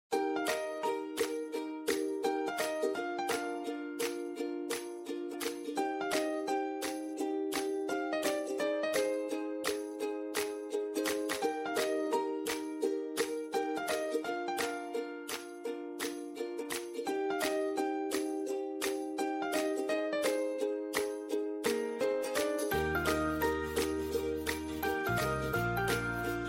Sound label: happy ukulele; welcome song